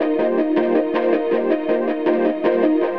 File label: AMBNT 160; Ambient